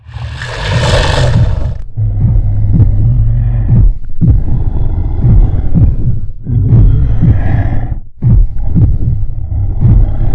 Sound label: heartbeat; Giants